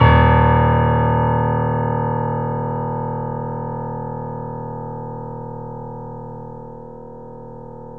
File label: 3D Pianos Yamaha C7; E-MU Producer Series Vol. 5